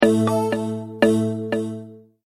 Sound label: Multimedia Tone-Thinking/Processing